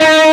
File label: Left-hand vibrato; osu